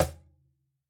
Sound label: Cajon hit f; Idiophones; Struck Idiophones